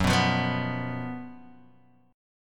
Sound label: Gb Major 7th Suspended 4th, down, Guitar, Open D